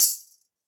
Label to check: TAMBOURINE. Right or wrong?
Right